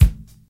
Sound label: kick drum sound A key, bass drum single hit tuned to the A note; kick drum samples